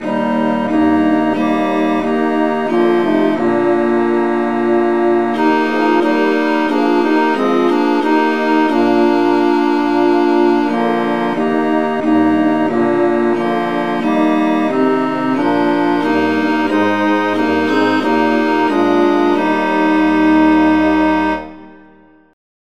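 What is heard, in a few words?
traditional, key E flat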